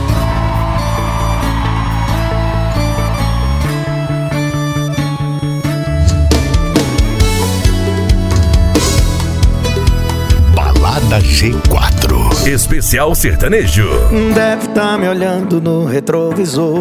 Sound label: sertanejo; balada g4